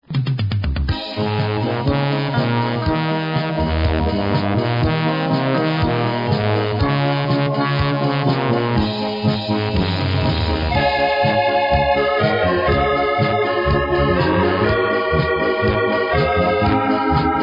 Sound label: Polka